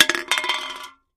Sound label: Aluminum Can Roll On